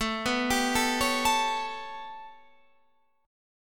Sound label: B 7th Suspended 2nd Sharp 5th A